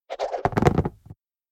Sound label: Roll